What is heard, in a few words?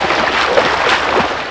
SPLASH